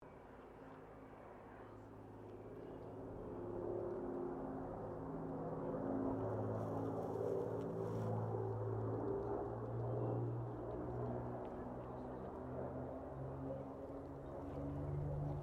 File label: AIRCRAFT SUPERMARINE SPITFIRE MK-XVI PASS